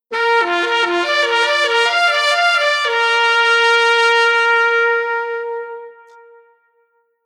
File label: Horn Unis Tp A Sax